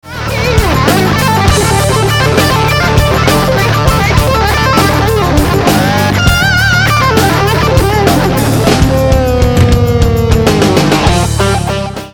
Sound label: legato